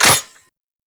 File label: Auto Crossbow Mech; Warframe; Weapons; Tenno